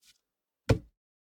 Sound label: discard; cards